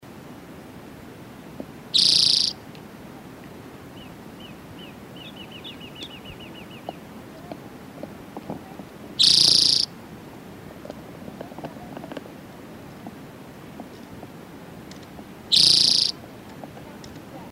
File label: Spotted Towhee